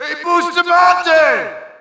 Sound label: Shout, audience; UFC Tapout 2